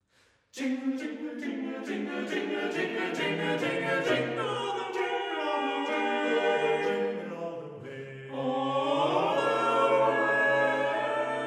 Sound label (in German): Jingle Beispiele